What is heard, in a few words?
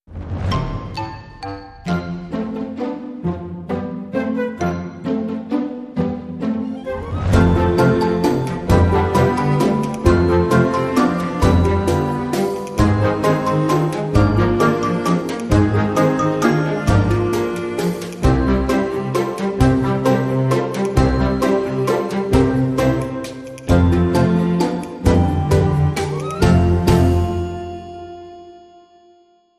Waltz